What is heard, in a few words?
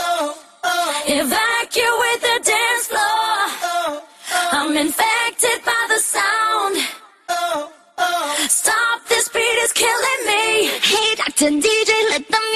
acapella